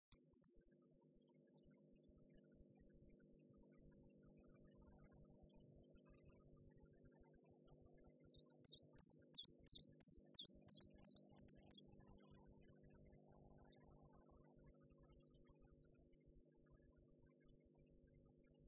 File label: common chiffchaff phylloscopus collybita; gransanger